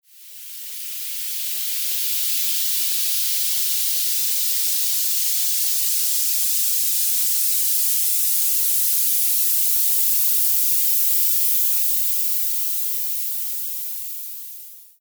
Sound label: Sweeping Violet Noise